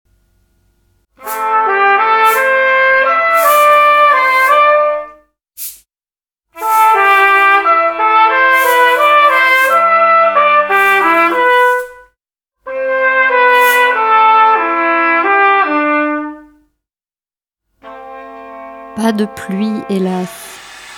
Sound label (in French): melodie du vent